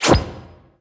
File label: hole open; littlehorn